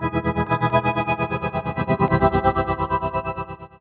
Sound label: magic